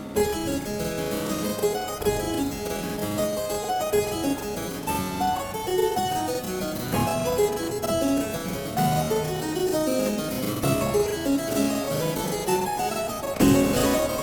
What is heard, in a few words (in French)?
K141 Continuation ralentie